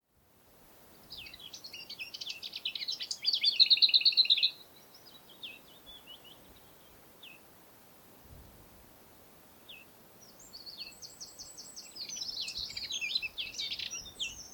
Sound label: lesser whitethroat curruca curruca